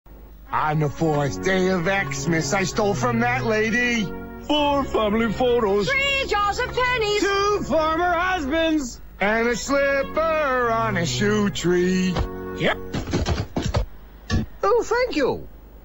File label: x-mas song